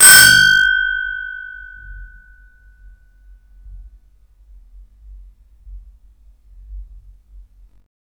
old door bell; from 1904

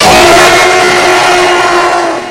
jumpscare sound; five nights at fangs 4

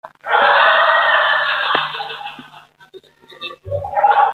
laugh

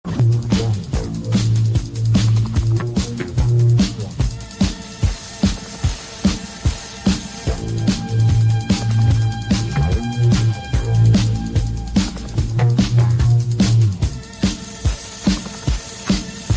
ominous bass and synth track

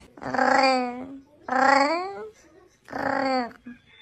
french cat

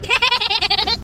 chuckle

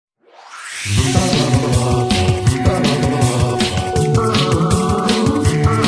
ost walrus chase; crash twinsanity

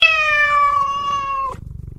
cat

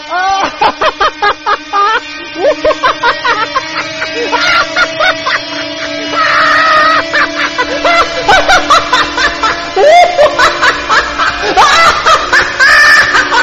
ahaha ha

